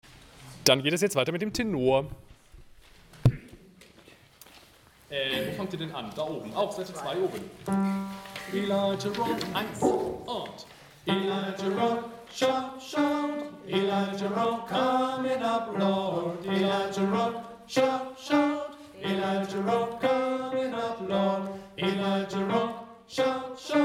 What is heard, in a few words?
TENOR; lijah Rock